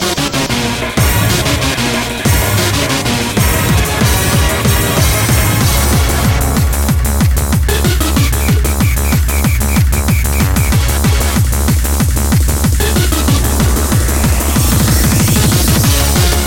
Piano Concerto No.1 in B flat minor, Op.23 - 1st movement